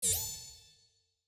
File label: open window